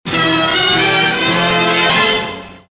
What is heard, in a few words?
scene change music; Transformers